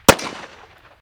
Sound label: smg, Guns Fire; civ13; civ14; Weapons